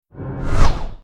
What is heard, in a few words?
impactshot cast